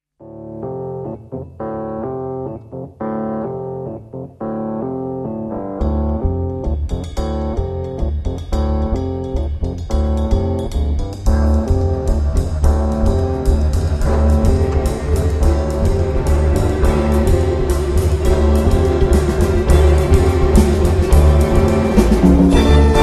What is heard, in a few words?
Jazz